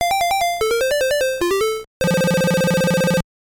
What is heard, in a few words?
Round Clear; Pac-Land; NES; Break Time